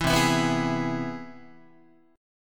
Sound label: C Augmented Major 7th, Guitar; strummed; JGuitar